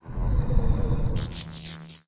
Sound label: lihorn idle, monster